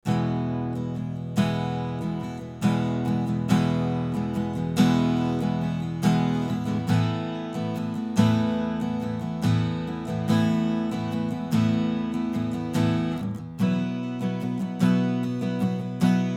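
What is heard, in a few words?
mono stereo miking; audio examples from the book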